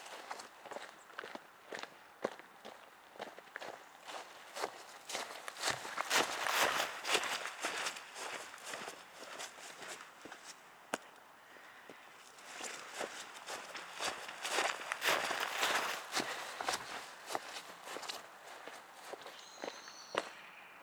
Pas herbe aller-retour

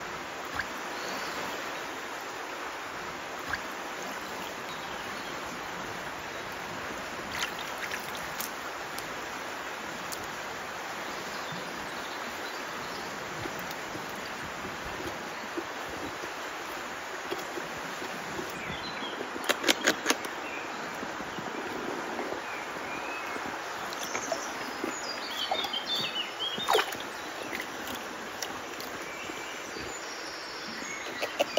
castor ronge

